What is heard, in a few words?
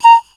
BAMB SH, BAMBOO FLT; Soundscan vol.60; Asian Traditions; AKAI